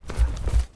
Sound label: run